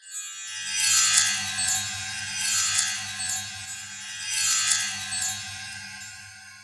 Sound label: hand of midas active